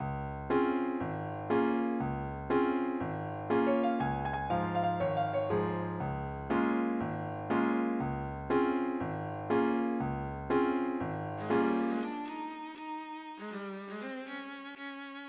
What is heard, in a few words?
tin horns